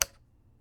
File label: Plastic Lid Snap